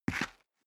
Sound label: step boots concrete grit single right; Footsteps; schritte